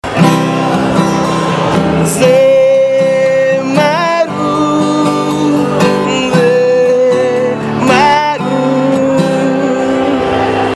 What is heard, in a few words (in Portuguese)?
vinheta cantada